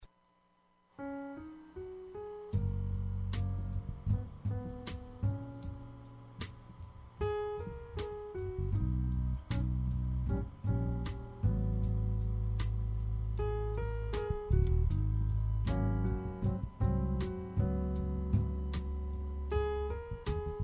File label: jazz